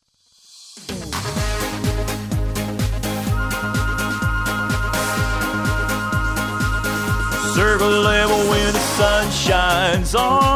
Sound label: vocal